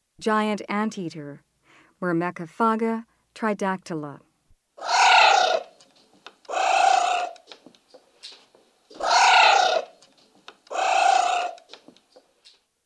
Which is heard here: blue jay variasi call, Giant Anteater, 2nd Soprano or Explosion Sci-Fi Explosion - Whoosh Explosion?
Giant Anteater